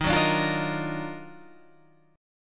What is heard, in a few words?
D sharp Minor Major 9th D sharp, Guitar